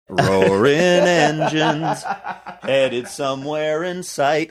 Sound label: singing roaring engines